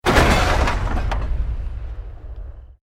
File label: EXPLOSION MEDIUM WOOD